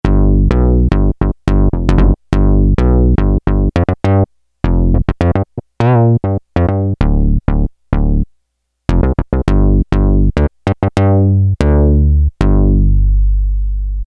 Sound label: Syn Bass 3, Roland W-30; Factory Performance Patches